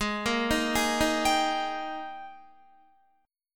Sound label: Ab Major 7th Suspended 2nd Ab down Guitar; arpeggiated; JGuitar